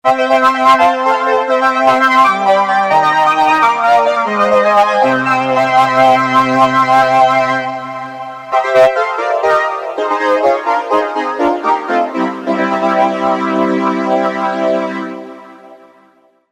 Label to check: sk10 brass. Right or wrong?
Right